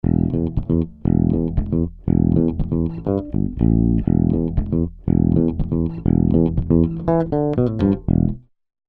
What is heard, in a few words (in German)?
E-Bass Transformer 12dB Input; SSL Fusion